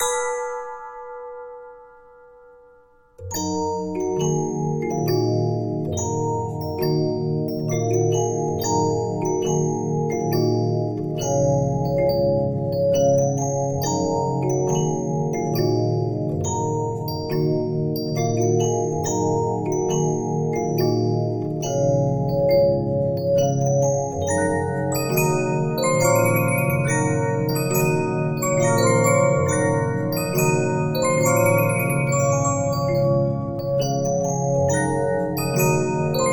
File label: Regina, 15inch disc musicbox